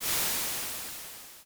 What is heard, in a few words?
Crash Waves, Vermona Mono15 Drums